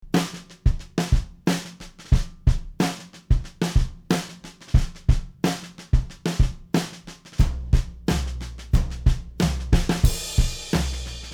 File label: snare stortion with raw